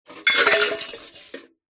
impacts metal cooking pots hit drop crash

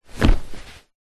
household mattress sit down on; zapsplat

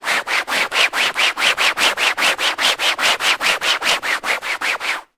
household couch wipe arm fabric clean cloth; zapsplat

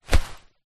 person grab person wearing raincoat; foley

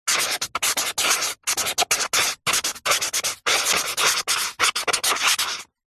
office pen felt marker write on paper

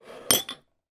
cups mugs x2 pick up by handles from table

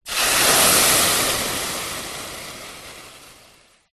household water pour pan sizzle burn

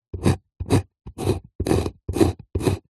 foley wood scrapes sharp knife scores several; zapsplat

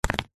papadum set down stack on another

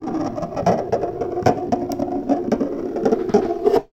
egg roll along hard surface

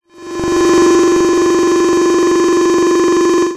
science fiction machine scanner scanning

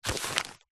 magazine cheap pick up; household; zapsplat

